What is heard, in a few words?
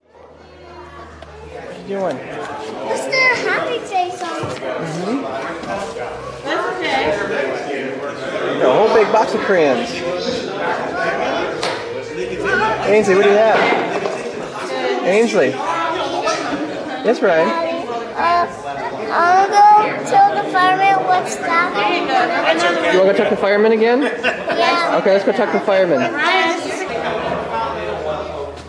firehouse, firehouse open house; enjoyed the firehouse open house on the 12th